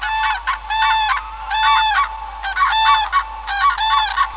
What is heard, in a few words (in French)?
grue cendree